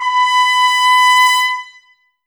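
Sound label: trumpets, brass; 90 s Sample CDs; Miroslav Vitous; Woodwind Brass Ensemble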